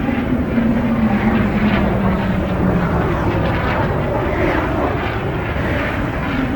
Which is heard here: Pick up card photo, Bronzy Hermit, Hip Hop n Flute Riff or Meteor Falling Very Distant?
Meteor Falling Very Distant